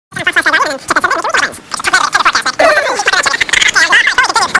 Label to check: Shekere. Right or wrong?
Wrong